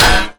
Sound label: chargebow, weapons